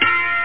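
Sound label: button sound; drwho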